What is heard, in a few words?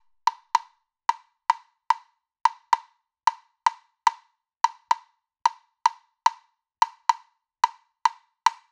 woodblock loop 110; perc construction loops; SEISMIC Drum Sample Pack; Slate